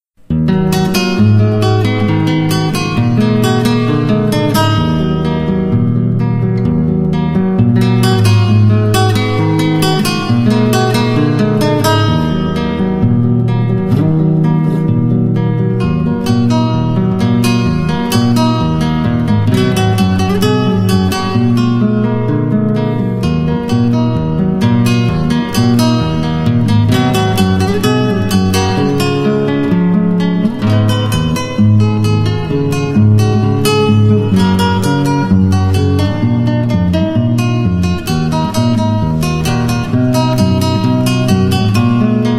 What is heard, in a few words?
guitar; STALKER